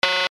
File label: control beep